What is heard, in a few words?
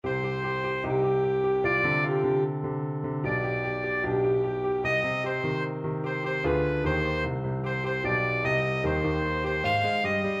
mozart dies irae, saxophone